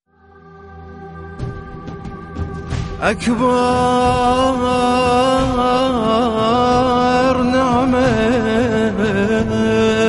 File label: NAWHA ARABIC